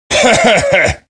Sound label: cheer; svencoop; voice commands; SeriousSam